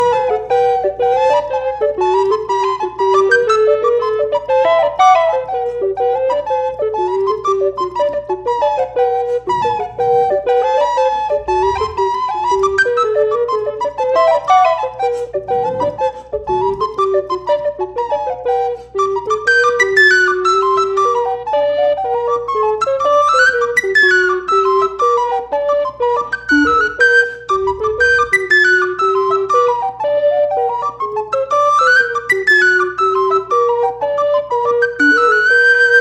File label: Jig